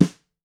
Steel Brush, Snares

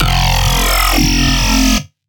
Bass F; Dubstep; Bass One Shots; Slug1; Ghosthack; Dubstep Sound Arsenal; 50 Bass One Shots

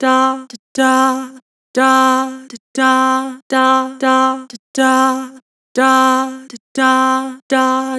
Vibrato "Dah", Vocals; Harmor Vocals

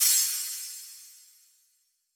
Wobbly Crash; trap; Cymbals; Cymatics; Trap Starter Pack

